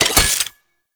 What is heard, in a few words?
Flame Spear Reload; Warframe; Grineer